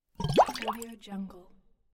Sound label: water bubbling